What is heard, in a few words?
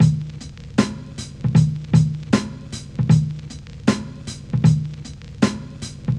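cristal clear, beats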